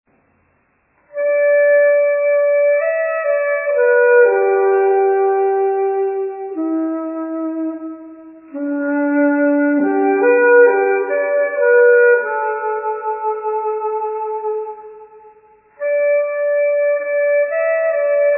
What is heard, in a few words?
Saxophone; Wesley; What a Friend